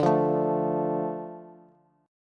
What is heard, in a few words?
D Minor D Bass; Dm strummed; x,17,15,14; JGuitar